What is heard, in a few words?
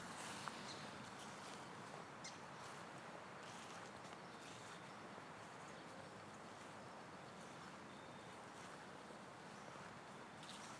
winter wren